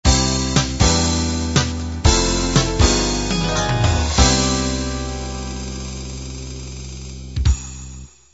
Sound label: half 8-16beat end